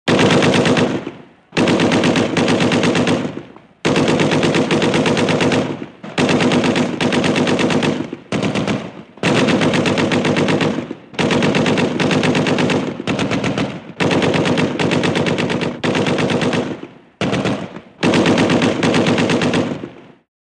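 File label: Machine Gun Rapid Fire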